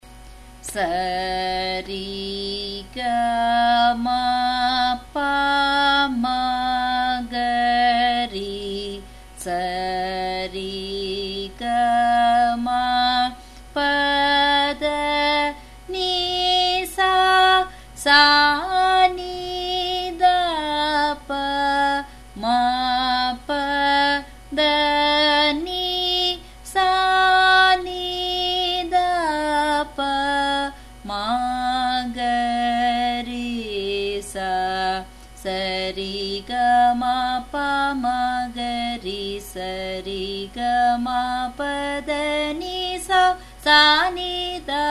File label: Sarali Varise, F sharp